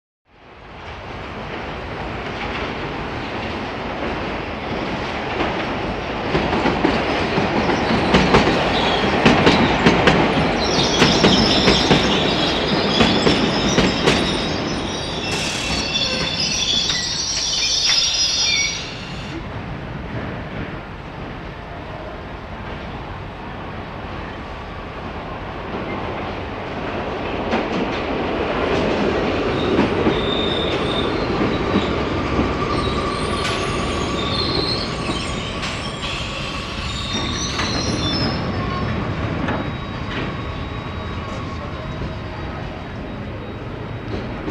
Underground Train In, Stops, Boards Train, Departs, And Then Stops And Departs Again.; In / Stop / Away